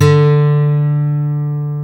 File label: GUI Ac. D3, GUIT AC; Best Service Gigapack 2